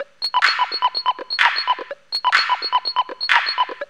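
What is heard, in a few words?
drum loop; X-Static Goldmine 3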